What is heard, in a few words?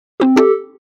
Open, Event sounds; LG KF900 Prada II